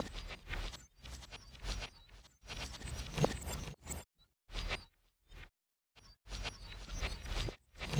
FX Ambient; Drums & Drones

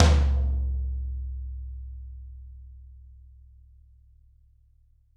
Toms, Drums; Bob Clearmountain Drums I

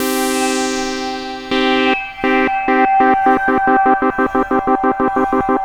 PAD, 170 BPM, Drum & Bass; Ueberschall; Drum & Bass Flash Trackz

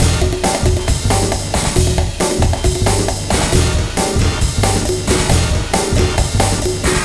Vegas Full Alt (W Hornz)-G, 136; Zero-G Kocktail Kollection; Construction Kitz (Lounge); Vegas Time 136

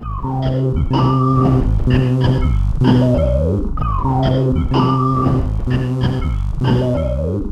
C3, Synth Line; NiceBeats

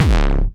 909 Grime Layer C#1, Kicks; Drums; Mbase 11 Kicks

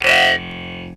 tuners

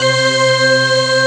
organ, elixia organ; Best Service ProSamples vol.10; House; AKAI

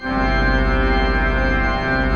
PED, Pipe Organ; E-MU Producer Series Vol. 2 – More Studio Essentials; Keyboards

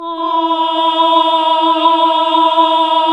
HAL AAH, Nuns Aahhh

